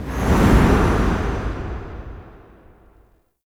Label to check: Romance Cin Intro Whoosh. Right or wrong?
Right